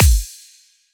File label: dubstep club kick